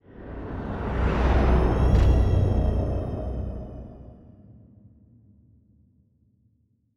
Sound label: ship juggernaut move; synthqueen; Stellaris; machineage